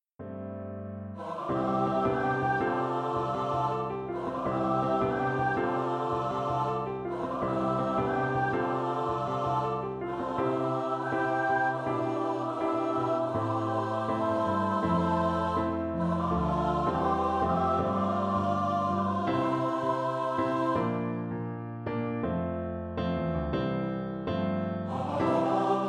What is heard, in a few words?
4 part song for SATB Choir